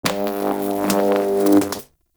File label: Teralyst Electric Step; Enemies; Steps; Warframe; Eidolon